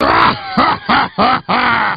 evil laugh; Wizpig

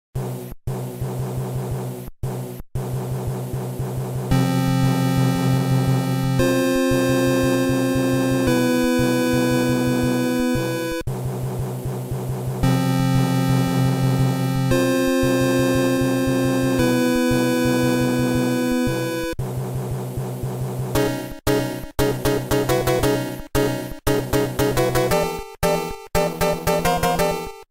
Airship Theme; SMB3; EU

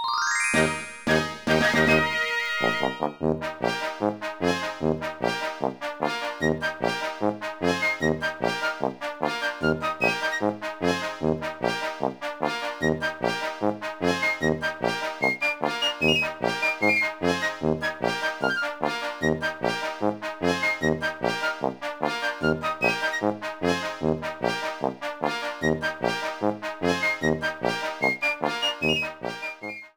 Unlock Message

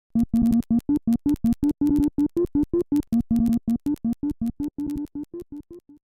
Arcade Hammer Theme

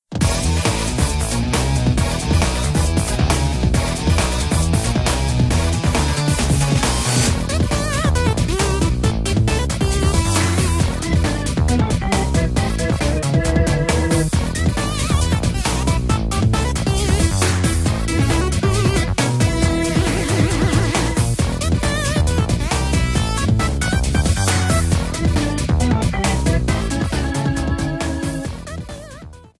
Music; MK8; DS; Wario Stadium

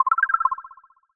Jingle; Dr Mario

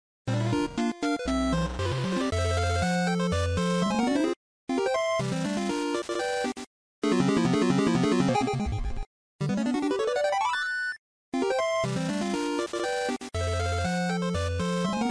Jingles; 9-Volt; WarioWare Gold

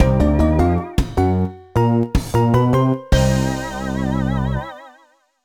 Warped Mansion Win

